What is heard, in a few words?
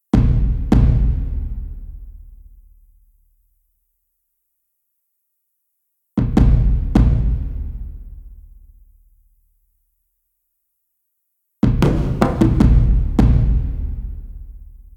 Different DRUMS, 154BPM